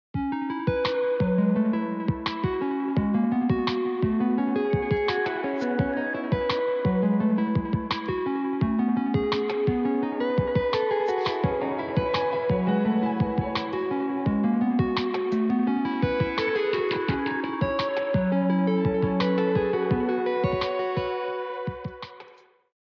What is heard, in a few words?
samsung galaxy s21, dzwonek; telefon komórkowy